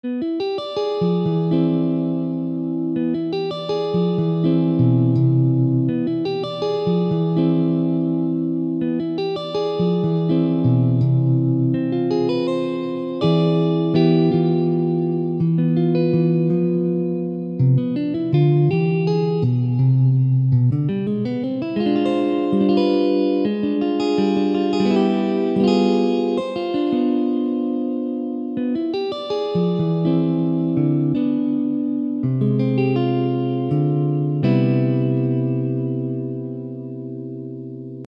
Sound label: etude, jazz guitar